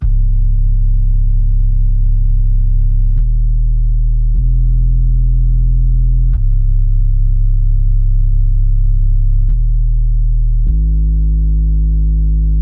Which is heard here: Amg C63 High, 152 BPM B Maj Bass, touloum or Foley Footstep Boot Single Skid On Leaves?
152 BPM B Maj Bass